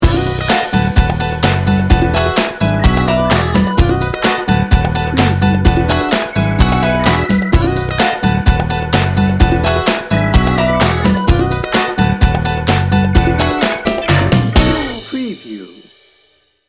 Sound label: happy hour